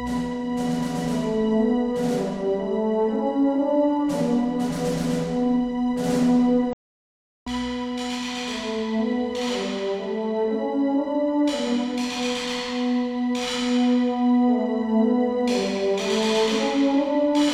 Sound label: sample Snare